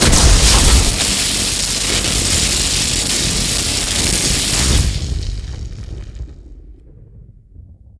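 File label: lightning storm loop, magic; skyrim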